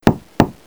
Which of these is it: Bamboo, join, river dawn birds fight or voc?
join